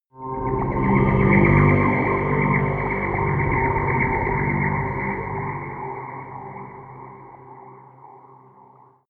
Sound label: mystic alien rising, drones